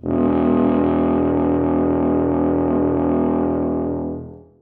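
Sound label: tuba sustain e1; Sonatina Symphonic Orchestra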